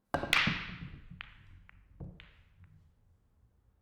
Snooker Break

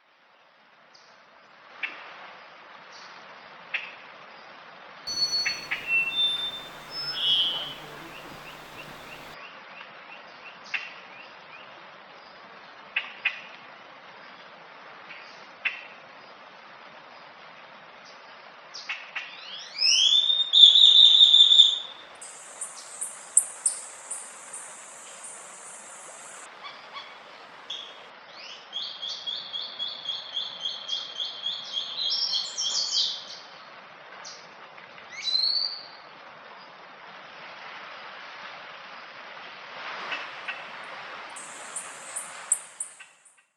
Birds In Rainforest

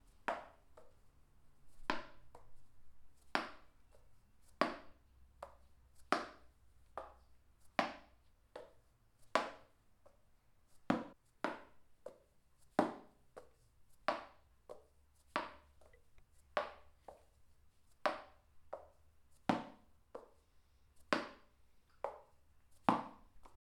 Tennis Ball Bouncing

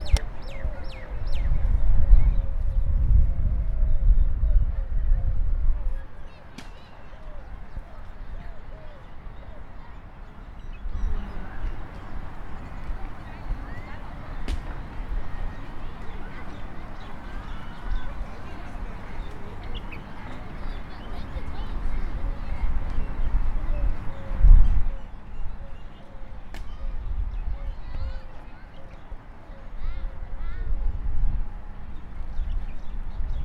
Park Zaabil In Dubai Ambient City Park And Birds

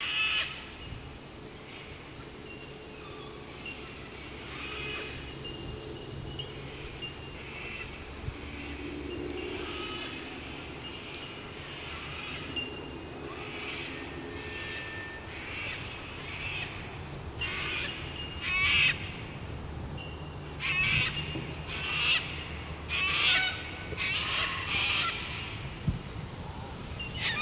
Bell Birds

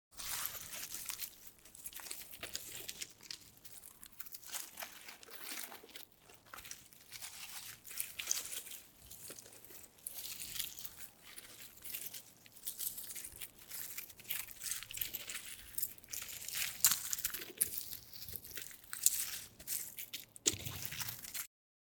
Wet Beach Sand One Take

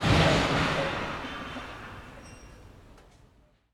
Fall Debris Crash

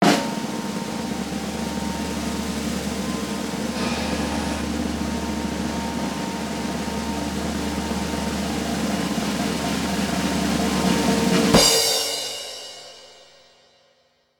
Snare Drum Buzz And Cymbal

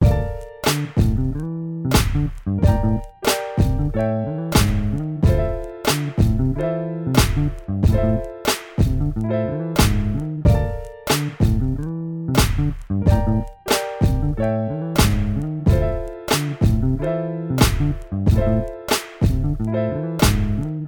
Chillhop

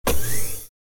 Hydraulic Office Chair Moving

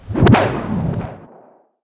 Wire Launch

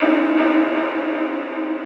Large Bang

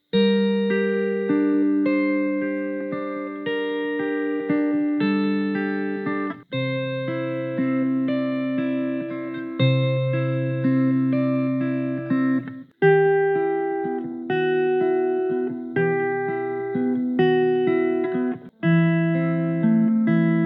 Sad Music On Guitar

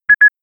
Double Beep

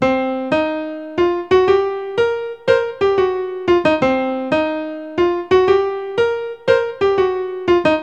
G Flat Min Walk

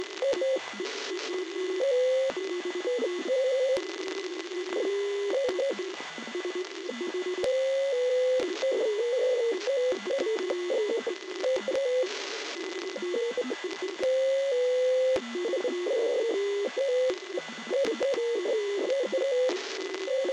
Radio Interference Artificial

